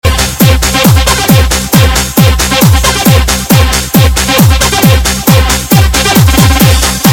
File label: Loop